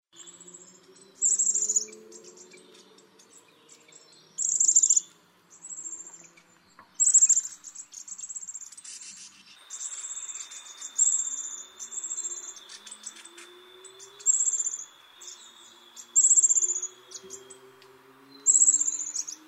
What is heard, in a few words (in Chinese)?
紅頭山雀; 紅頭長尾山雀; 長尾山雀科/留鳥